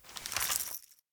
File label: ammo rattle; reapr; tacz; RFP v1.0.0 beta2